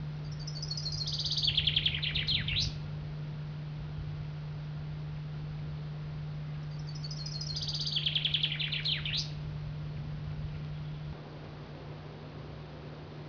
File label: Madeira Chaffinch song